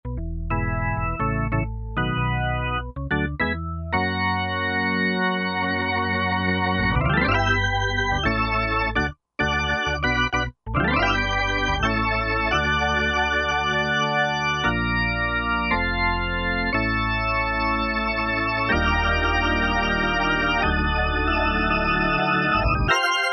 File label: Roland JD-990; CLS 222 (Mod) 2; Factory Patches examples